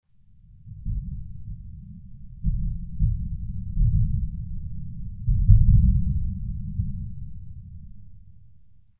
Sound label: rift creak; Between Lands